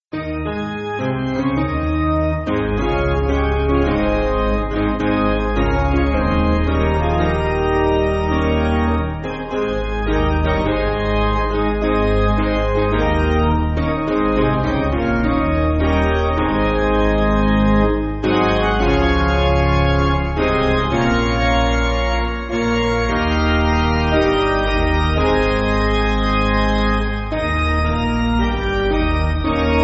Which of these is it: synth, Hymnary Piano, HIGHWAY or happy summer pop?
Hymnary Piano